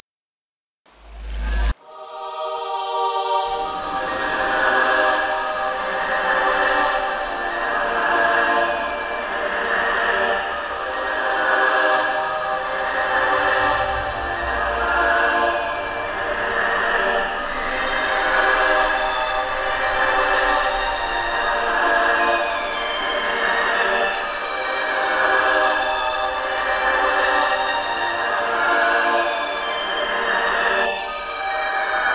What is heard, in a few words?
bgm